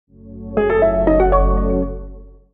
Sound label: HYUNDAI Logo sound